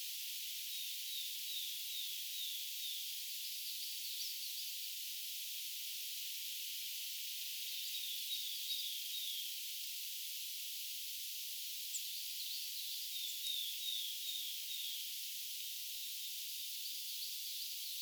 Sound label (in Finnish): homotiainen laulaa